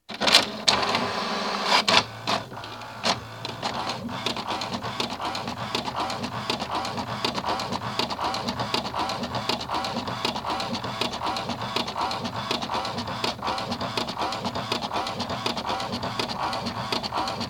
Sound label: Printer